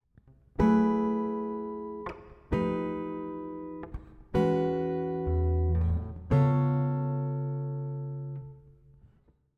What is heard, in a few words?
Extended chords progression, guitar